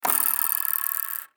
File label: Menu Tally Score Spinning